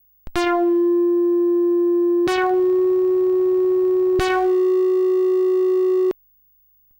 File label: amiga500 protracker highest notes finetune7